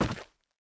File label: Nomal Man Walk